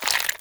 Sticky Ink Tentacle Retract; Gameplay; Mutator; Warframe; Shadowgrapher

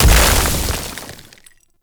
Plunge Ability Ice Explosion; Enemies; Abilities; Warframe; Duviri; Dax Knight

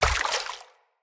Step Water, Footsteps; Enemy; Corrupted Sproutfolk

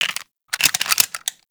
Double Barrel Shotgun Reload Load; Warframe; Weapons; Tenno

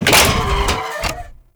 Thumper Bot Leg Damaged; Warframe; Grineer

